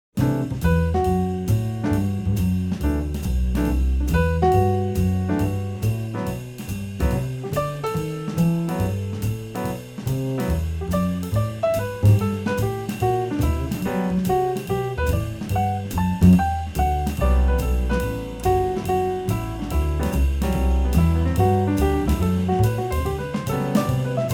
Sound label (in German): c lydisch